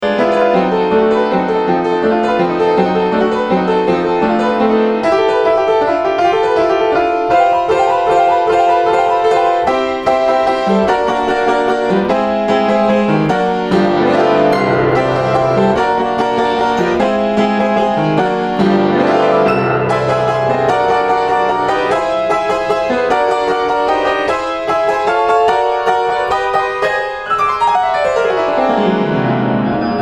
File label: Piano Prelude; Pour Le Piano; Debussy